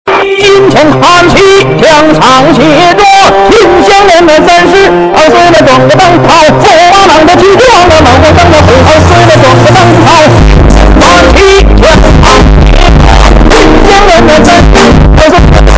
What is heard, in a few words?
chinese rap bass boost